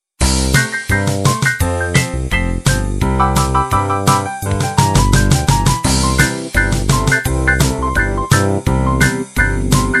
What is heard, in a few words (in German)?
Playback Boogie